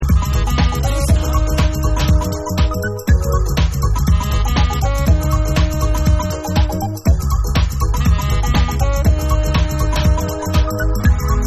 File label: Sax Mix